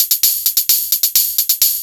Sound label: hihat 2, 130, drum loops